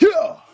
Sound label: huh, Voice